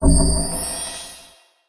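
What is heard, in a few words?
quest start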